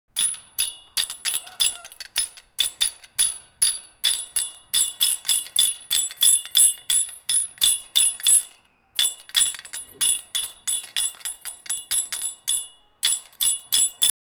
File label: the sound of ants fightin